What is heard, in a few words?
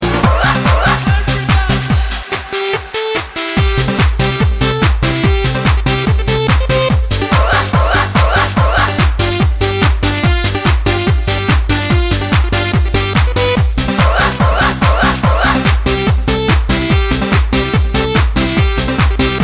Rock